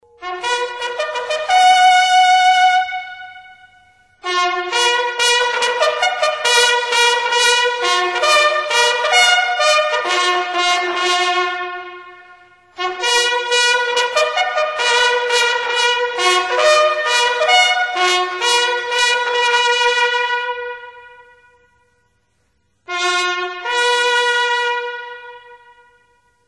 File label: Colours, navy bugle